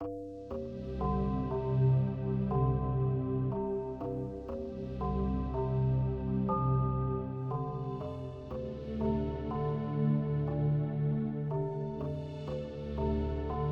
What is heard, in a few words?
Safe Room Music; Night of the Consumers